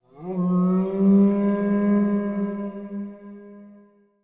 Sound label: howl; The Elder Scrolls III - Morrowind